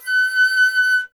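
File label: flute F#; Miroslav Vitous - Solo Instruments VOL-2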